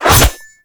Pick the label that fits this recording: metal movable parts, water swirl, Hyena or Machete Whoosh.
Machete Whoosh